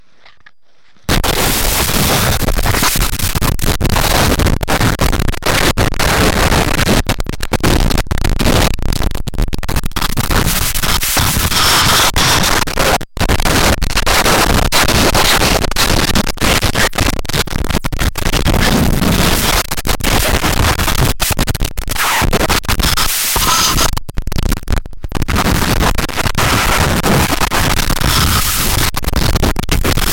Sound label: Convulsion contact Mic; audibleDisease